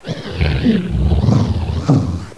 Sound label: pit drone hunt; svencoop; sectore